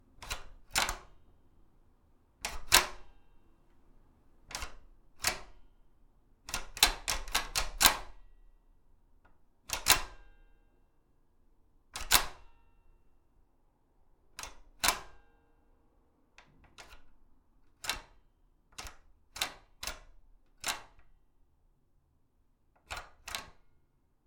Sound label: Door Latch